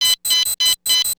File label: clock; Hunter The Reckoning Redeemer; XBOX